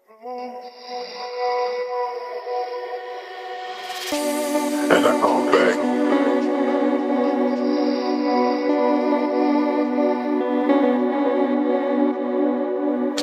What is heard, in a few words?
deep house remix